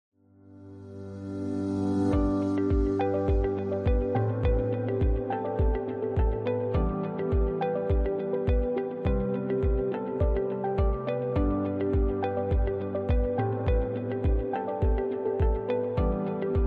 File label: the upbeat ambient music